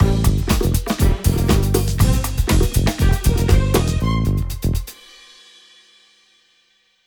Bonus Music Loop Outro; genesis; euro Golden Cup

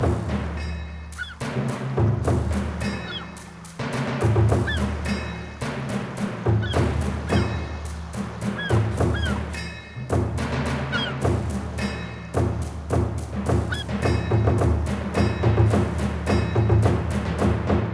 Bonus Loop Loading Screen; genesis; jasons Quest Desktop

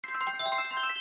Counter Mega Win Loop; fire Wizard; Axial

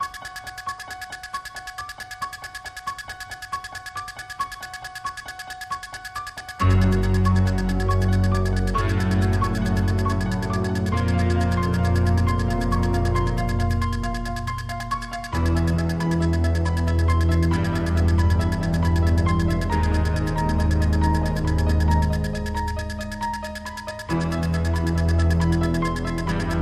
Music Free Spins Loop; halloween Desktop; Mahi Gaming

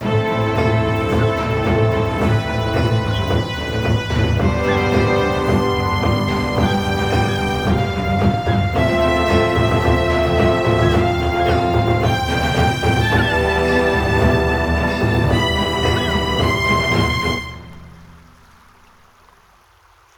Bonus Loop Outro; genesis; jasons Quest Desktop

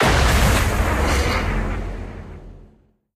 wild explosion; Dragons Myth

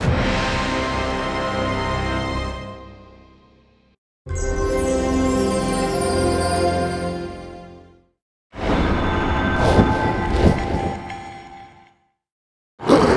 Win Sounds; Wicked Tales Dark Red

